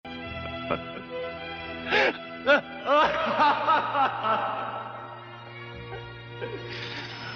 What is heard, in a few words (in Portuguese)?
risada